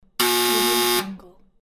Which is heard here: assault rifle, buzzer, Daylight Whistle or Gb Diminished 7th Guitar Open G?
buzzer